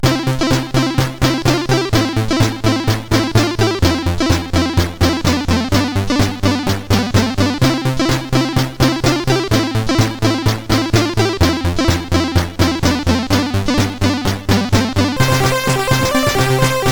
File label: Music Samples, sid, CSG8580R5